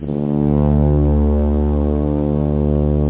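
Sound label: Orchestra Brass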